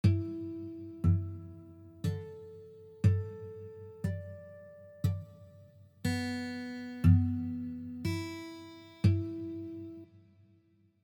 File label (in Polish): strojenie flazo